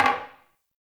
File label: Single Wooden Impact; Warframe; Ambience; Nineteen Ninety Nine